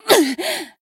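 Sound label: damaged, Japanese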